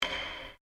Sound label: Drum Taiko Rimshot, Japanese Taiko Drum; Miscellaneous Musical